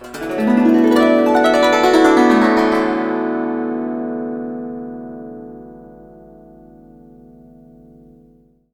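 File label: guzheng; Spectrasonics; Heart of Asia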